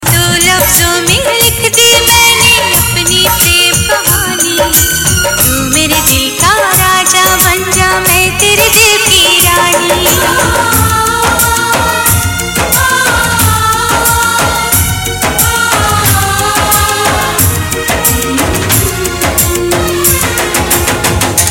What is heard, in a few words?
romantic tone